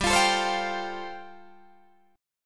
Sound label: G 9th Suspended 4th, Guitar DADGAD